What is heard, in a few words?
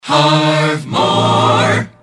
jingles resings jock cut accapella; pams series 42a; harv moore